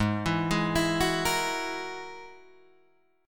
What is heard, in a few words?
G sharp 7th Suspended 2nd Sharp 5th, Guitar, Drop D; down; chord shape